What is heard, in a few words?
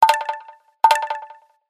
imperial notification